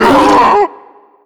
pain, zombie vox; creatures

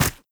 step run, footsteps, player, mud

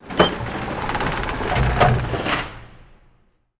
gate open; ambient; Half Life 2; Episode 2; outland

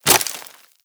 debris wood, bullet impact debris

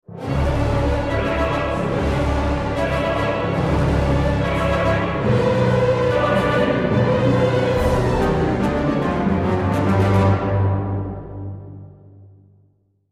bomb ten sec count

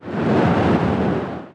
wind

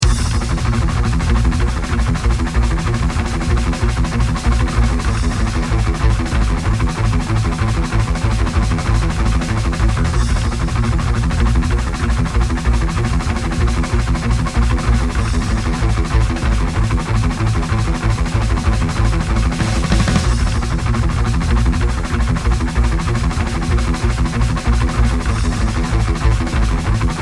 bomb planted, music

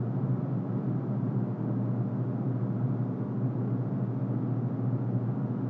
dust indoors lp, ambient atmosphere

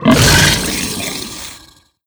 death, creatures, barnacle; Half Life Alyx

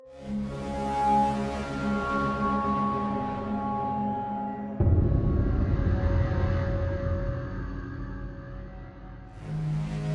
drainage barnacles, music

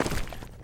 footsteps, mud; player

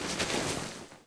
foot slide, zombie; Half Life 2

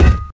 physics cube lg; Portal 2

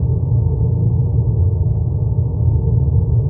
amb baggage spooky, ambient atmosphere

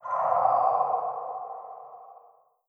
idle wet, headcrab reviver; creatures; vox

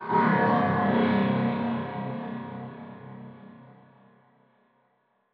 strider distant; ambient; combine

